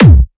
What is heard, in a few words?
kick